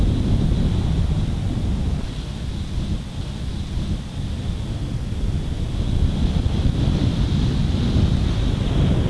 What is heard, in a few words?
song; standingstone